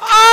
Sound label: Perfectly Cut Scream; Beat Saber Miss Sounds